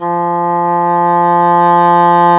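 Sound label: WND English Horn, Swell; Roland L-CDX-03 Disk 1; Eng Horn Swl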